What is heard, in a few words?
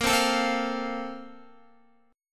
A sharp Major 7th Suspended 2nd, Guitar; A#M7sus2; strummed; JGuitar